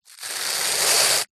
household hairbrush bristles plastic scrape carpet; zapsplat